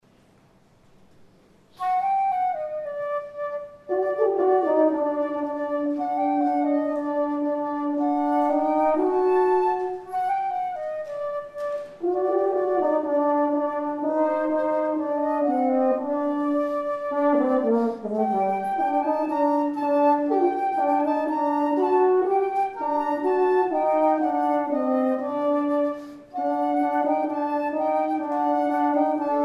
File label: Flute Horn Duets Hasidic Melody; Yi-Yi-Yi-Yisrael V'oraita (Open Your Ears, O Faithful People) (Hasidic / Melody)